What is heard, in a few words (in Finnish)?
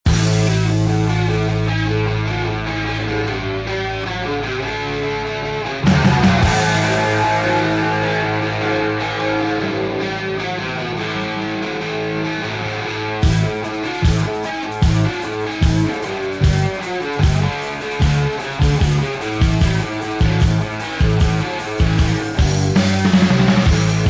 disco lyhyt